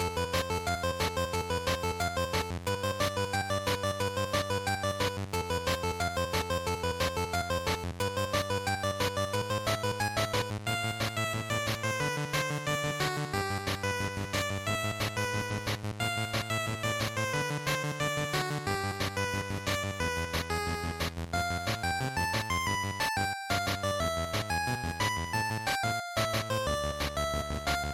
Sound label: Racing Stage Loop, bgm